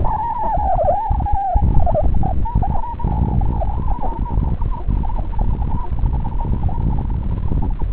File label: candle denoised, light to sound